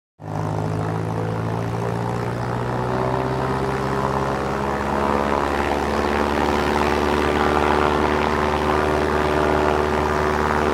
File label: Prop Plane Exterior Steady Small Twin Engine With Revs; Planes & Trains